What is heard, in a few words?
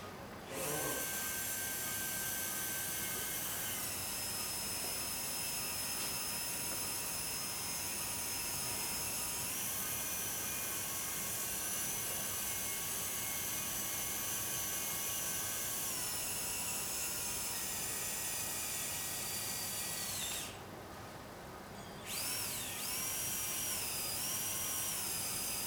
Bicortical Drilling of Tibial Fracture